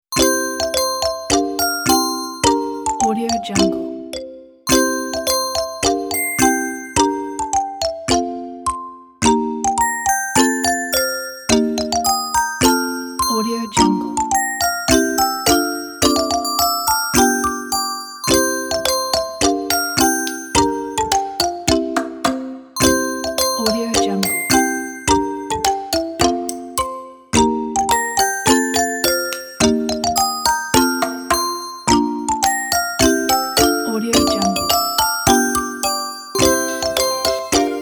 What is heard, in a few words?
tinkle tinkle